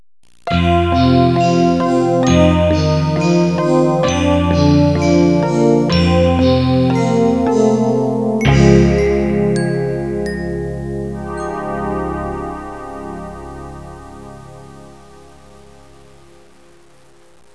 musica newage

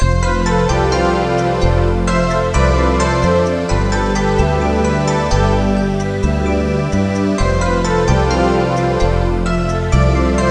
suonerie contemporanea; musica contemporary

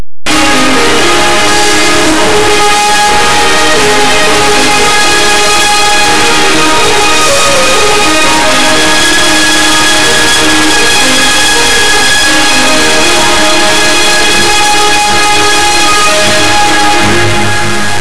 musica newage

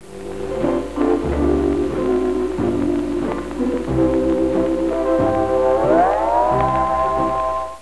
suonerie musica hawaiian

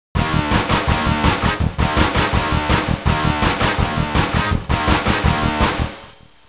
suonerie musica rock

